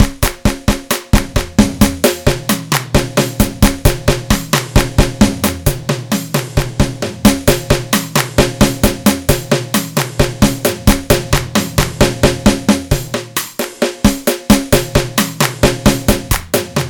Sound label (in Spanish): Ritmo Hydrogen